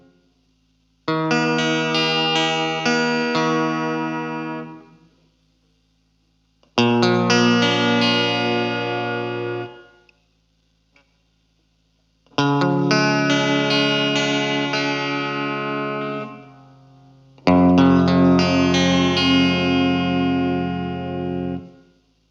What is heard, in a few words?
Strat; SPL Cres; Shure